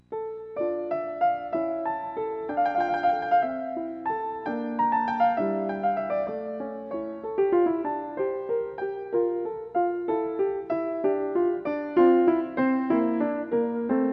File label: Scarlatti Sonata d moll